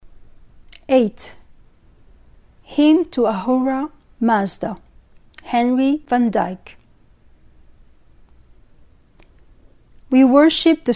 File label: Hymns Hymn of Ahura Mazda